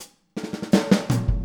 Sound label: Boogaloo Intro Full Mix, Drums, 82 BPM; Sonic Foundry; Drums from the Big Room The Mixes